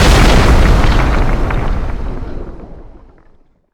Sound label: Nuclear Blast Shockwave; explosion blast